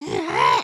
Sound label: generic build